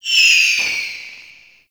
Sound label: Projectile; Technorot; Warframe; Infested Lich